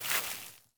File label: grass step, footsteps